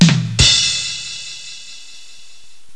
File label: Drum